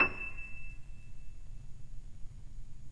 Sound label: PNO PP; Synclavier Sampler Library